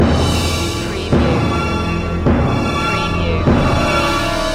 orchestral suspense loop

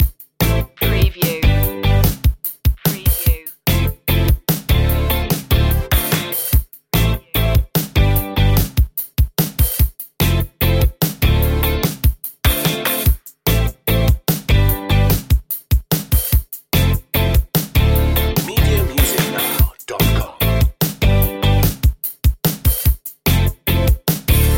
neon shots loop

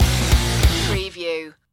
metal rage stinger

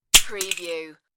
Mouse Trap

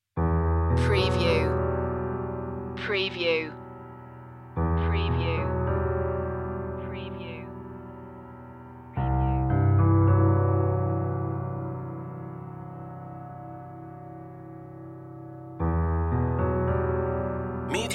piano only

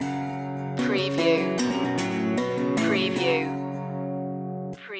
wild west guitars sting

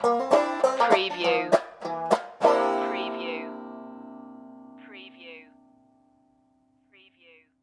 stomp stinger

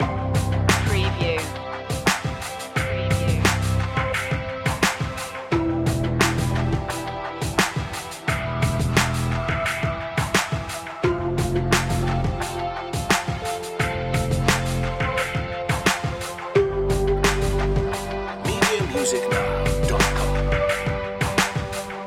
deep ambient loop

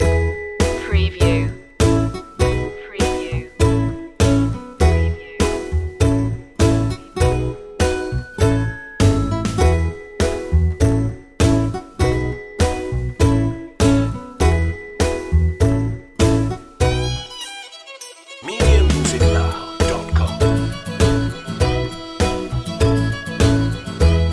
country thing long looping

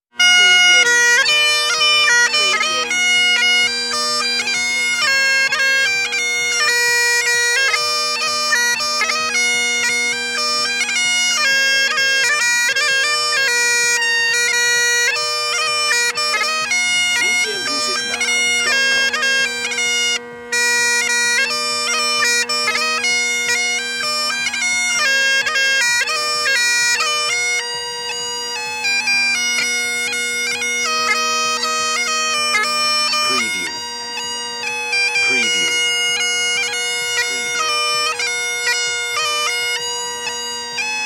Bag Pipe Jam

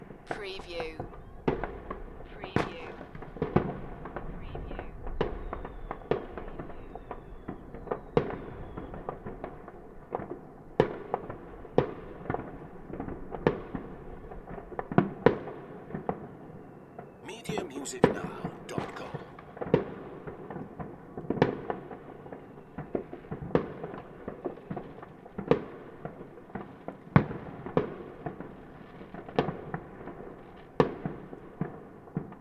New Years Fireworks; beanstalk audio

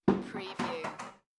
Dropping a Plastic Bucket; beanstalk audio

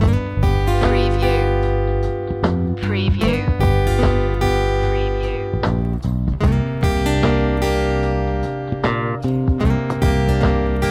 blues loop

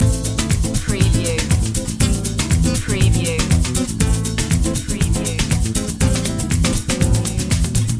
techno samba loop

